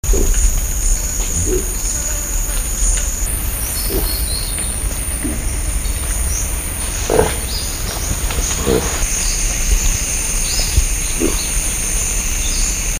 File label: Grunt